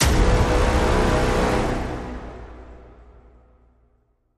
Stab Big Brass (B)

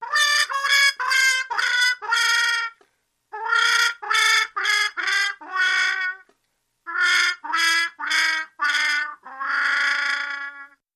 Trumpet Mute Wah Wah With Growl

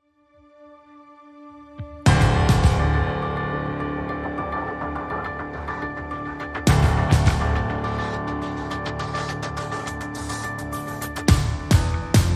No Trailer FX No Lead Strings

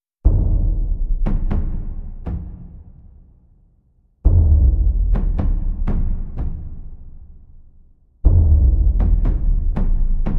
Drums

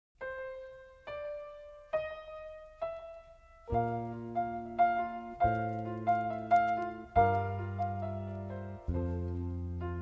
No Drums & Guitar

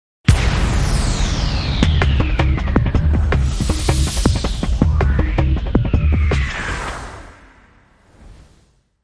Banger Stab & Drums

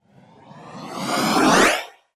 Hollow Tube Sweep

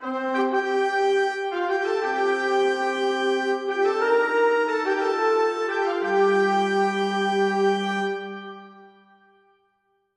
Fanfare

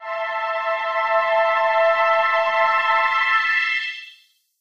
Synth Chord Sweep

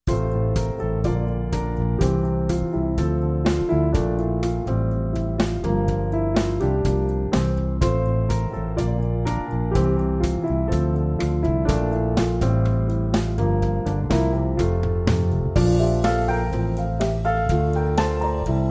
With Trio

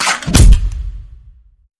Spring Victory Hit

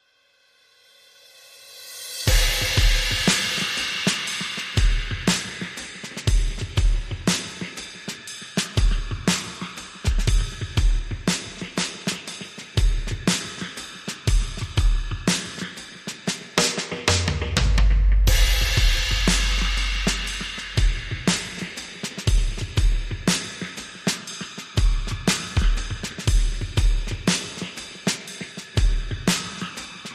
Drums Only Mix

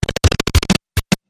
Short Gritty Stutter

